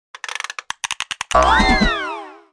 prize idle circus jumper; Prizes; Ringling Bros. and Barnum & Bailey; Wii

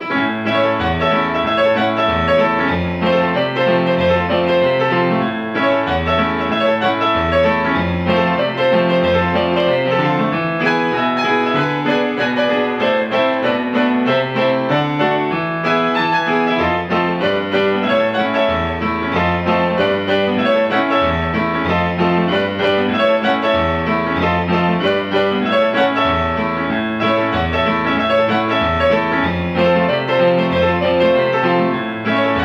Bar Piano; gunfighter ii revenge of jesse james; ps2

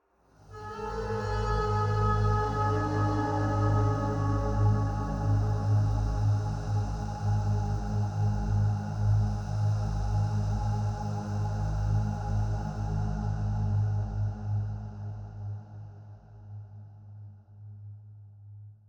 City Music Intro Start Game

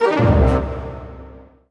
Settlement Liberated; Shattered Throne

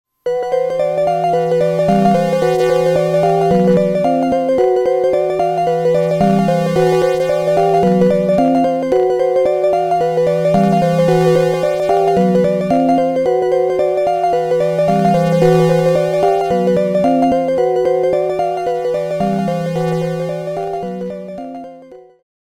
Strange power; Destruction; PC-8801

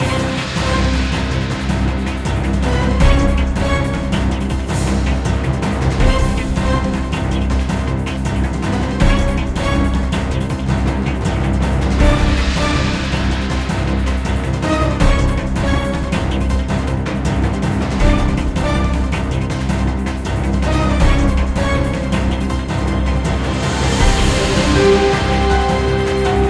Boss fight

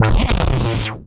SFX Die; GONNER

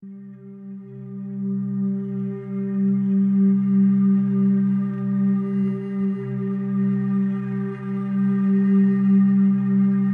Pause Loop

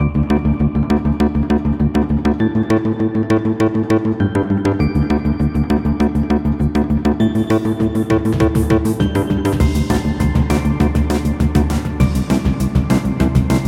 Music Punch; rawr off switch gamerip 2019

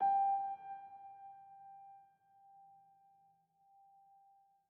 Env Field Night Ground

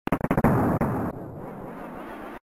Explosion; meco kong windows gamerip 2013

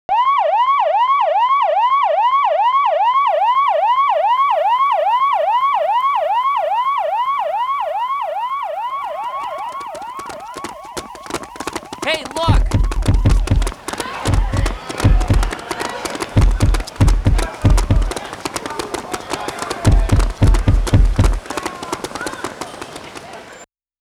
Police Car; Clock Tower 20th Anniversary Sound Collection

